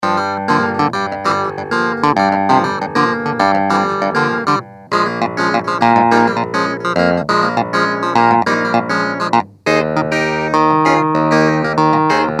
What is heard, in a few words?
Hohner Clavinet D6 Pickup